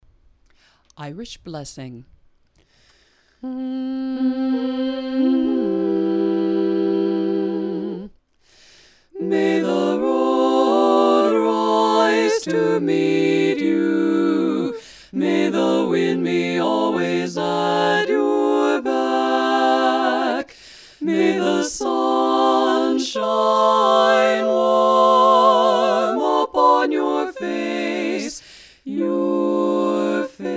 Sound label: Irish Blessing, Show Chorus